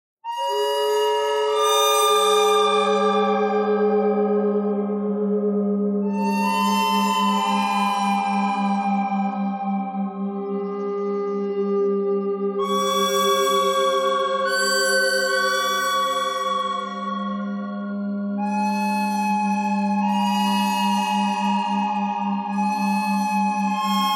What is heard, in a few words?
bird thread harmonic; noreverb